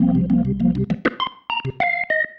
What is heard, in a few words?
Single Riff, Organ